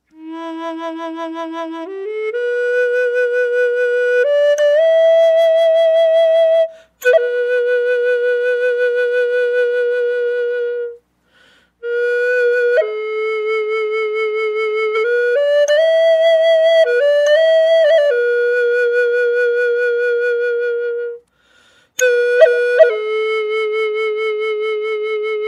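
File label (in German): E ohne Effekte, drone flute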